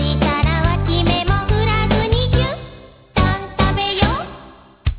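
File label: guitar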